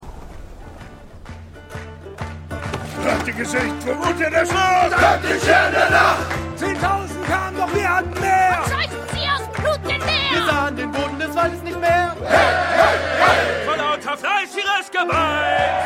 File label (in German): Gesang; House of the Dragon